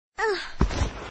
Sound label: dead girl